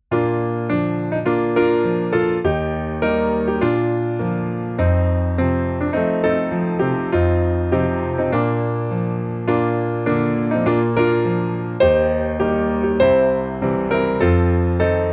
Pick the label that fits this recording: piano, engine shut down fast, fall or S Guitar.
piano